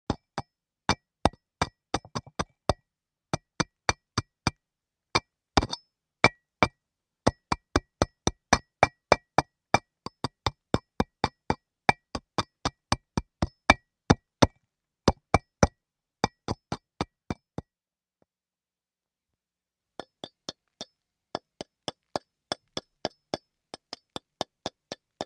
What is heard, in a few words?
Chisel Rock Lht Tap, rock exterior